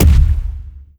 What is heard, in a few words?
bass heavy, zombie blind step; Half Life Alyx; creatures